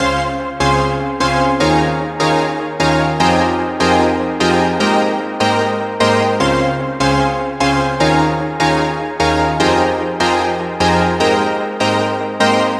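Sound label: synth loop vibe chords 150 F minor; Virtual Riot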